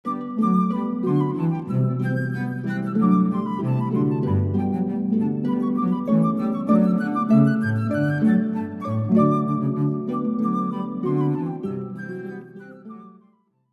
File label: Bouree, harp flute cello; Handel